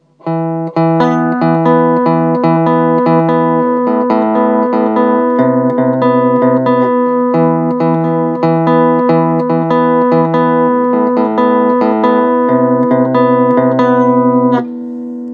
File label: corduroy bridge